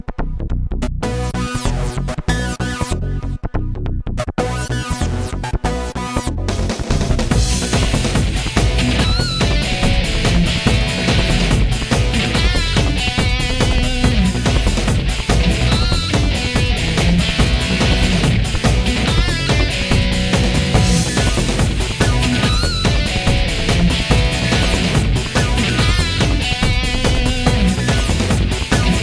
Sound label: Normal Battle; Smt 3